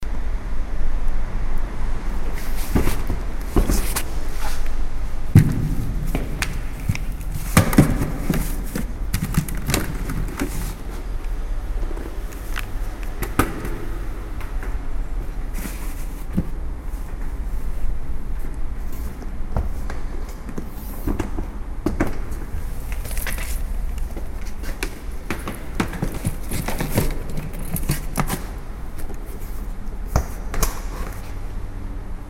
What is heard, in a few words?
indoor